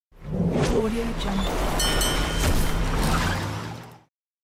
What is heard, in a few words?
stopping truck